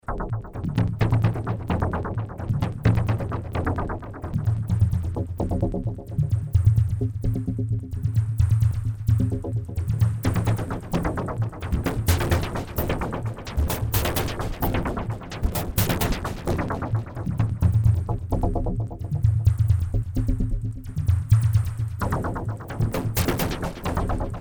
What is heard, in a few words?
yamaha dx 200